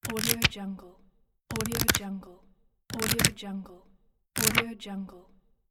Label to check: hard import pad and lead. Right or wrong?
Wrong